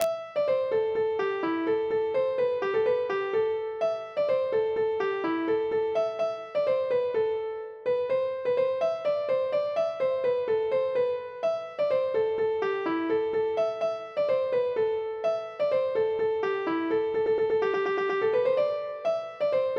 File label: polka harmonica